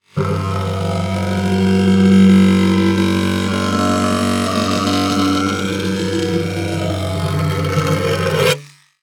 Technology Electronic Trimmer Buzz On Hollow Surface